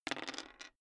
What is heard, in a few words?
dice roll table